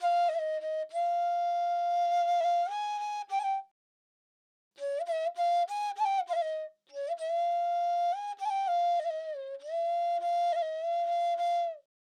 Dancehall Flute Fmin 100 BPM

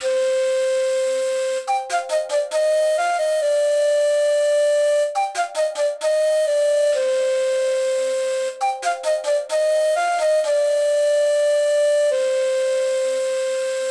Indian Flute 138bpm C min; New Nation

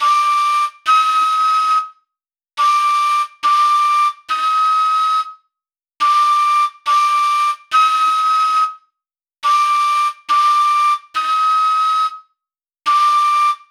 African Flute 140bpm D min; New Nation

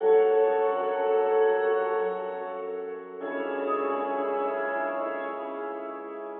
Darkest Pad 150bpm F min

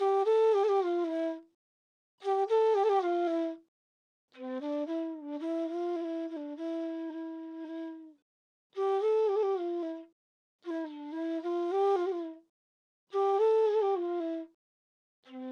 Trap Flute F maj 110 BPM

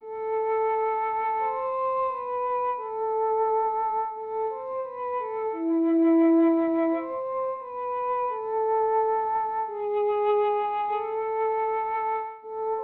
Old Radio Flute 87bpm A min; New Nation

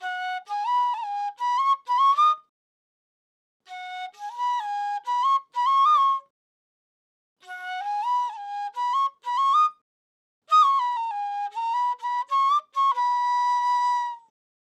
Hip Hop Flute B maj 130 BPM

